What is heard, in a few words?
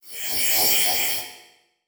Transition Sweep Scanner; Production Element; Title Transition; AdobeCC